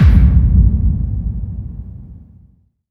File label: BD-FX, Dance Mega Drums; Best Service